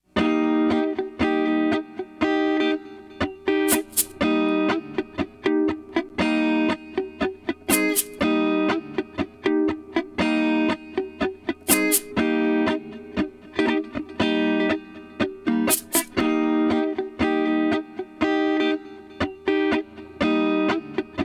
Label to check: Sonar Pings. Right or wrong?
Wrong